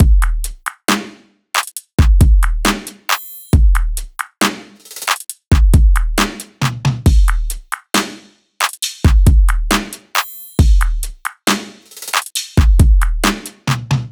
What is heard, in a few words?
SEISMIC loop full 136; iris; Seismic Loops; SEISMIC2